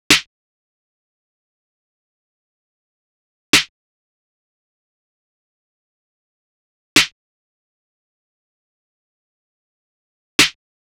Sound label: Drum Loop, 70 BPM Snare; Cymatics; Vibes II Drum Loop Collection; Dialed Drum Loop